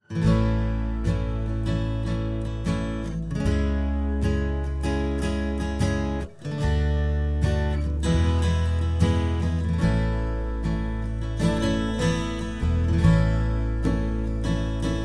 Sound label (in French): Instrumental